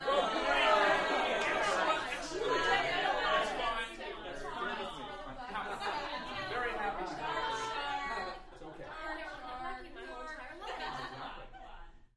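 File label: Crowd 15 Interior Rowdy Yells; small int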